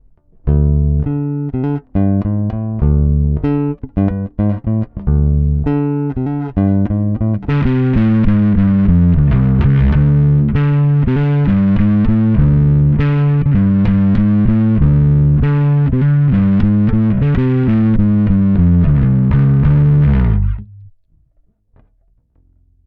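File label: Clean dirty dry, guitar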